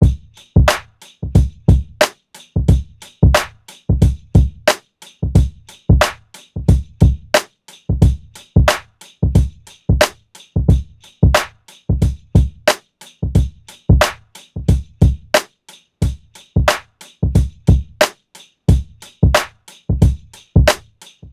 90 drum loop; aquamarine

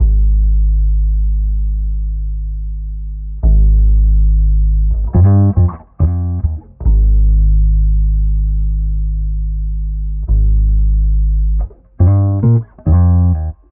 140 electric bass guitar Cm

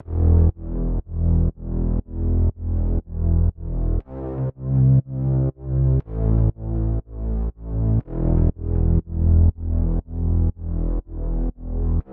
Bass Em 120 BPM

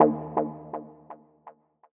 D2 over lead

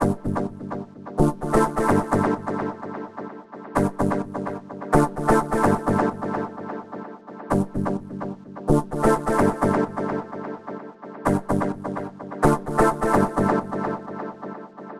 synth loop neo rave 128

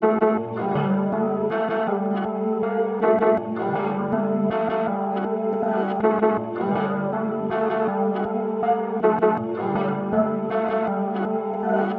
Melody Loop Gmin 160bpm

